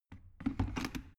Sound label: plastic water boiler place soft